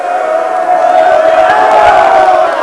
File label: crowd